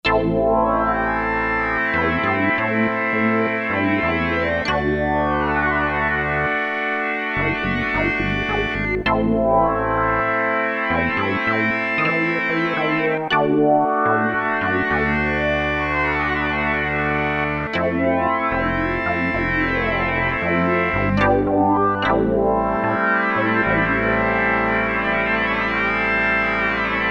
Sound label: NEG SYNC, Roland Jupiter-8; Factory patches